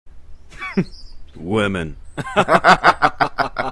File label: Women Hahahahha